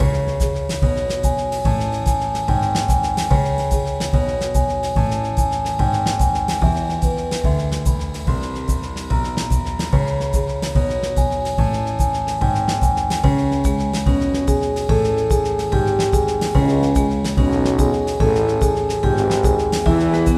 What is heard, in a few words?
Train Tense; Guilty Party; wii gamerip 2010